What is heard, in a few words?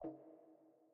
Start Pause; Game rip; MiSide